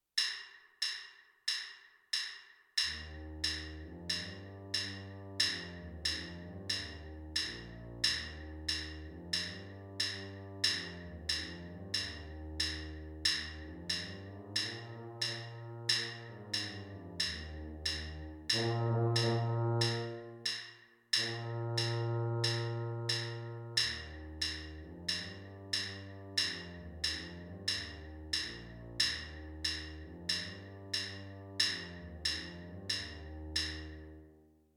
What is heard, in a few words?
alouette tuba